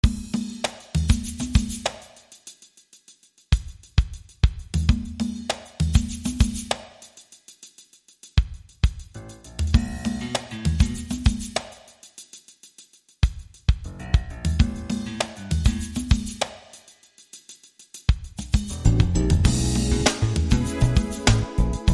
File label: Jazz